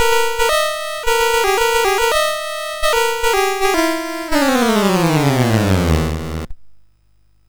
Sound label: sid MOS6581R3